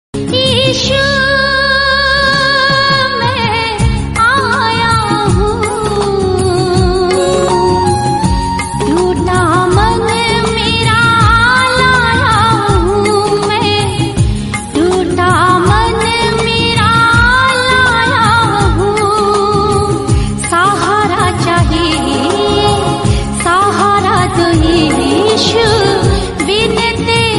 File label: hindi christian